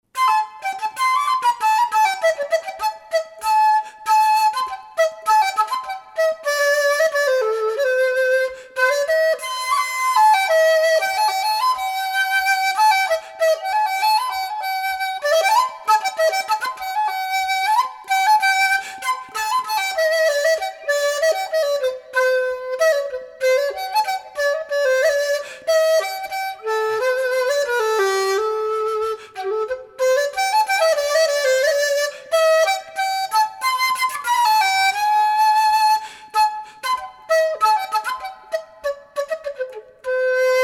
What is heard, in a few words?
chinese melody